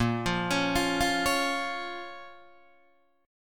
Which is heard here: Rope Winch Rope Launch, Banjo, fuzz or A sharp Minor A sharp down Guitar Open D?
A sharp Minor A sharp down Guitar Open D